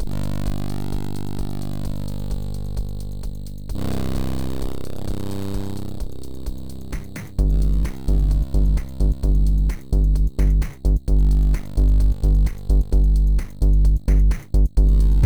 Music Fight; MiSide

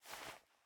Item Hand Move; MiSide

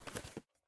Box Take; Game rip; MiSide

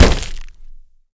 Door Close Meat; MiSide

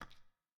Fight Step; Game rip; MiSide

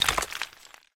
Figure Drop; Game rip; MiSide

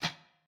Fight Jump; MiSide

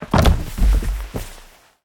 Jump Fail; MiSide

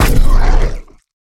Enemy Death; Game rip; MiSide

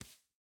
Hand Step; Game rip; MiSide

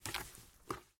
Glue Cup Out; Game rip; MiSide

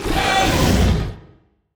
Monster Screeching; Game rip; MiSide

